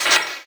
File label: glass hit; duck game